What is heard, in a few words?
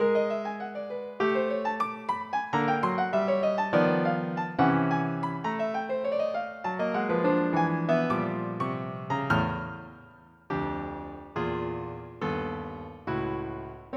piano